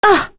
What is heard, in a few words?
Vox Damage; en; voices; heroes; Lewsia A; kings raid